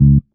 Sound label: marimba; ゆめ2っき